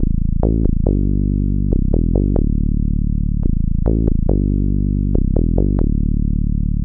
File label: TECHNO BASS 140 A